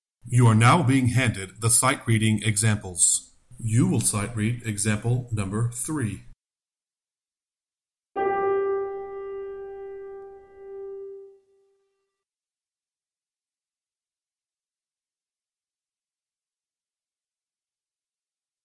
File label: Sightreading Example Alto